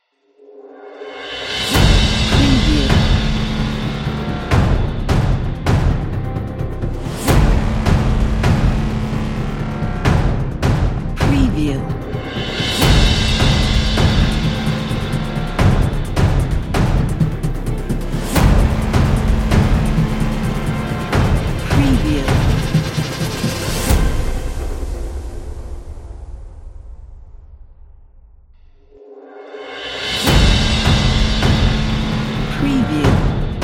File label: trailer logo opener